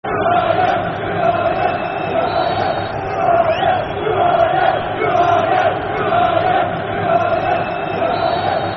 Who Are Ya [Football Chant (Soccer Song)], Scotland; Scotland Football Team; Scotland Scottish